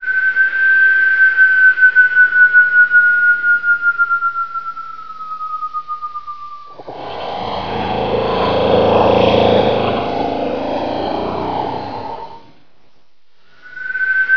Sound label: snore trad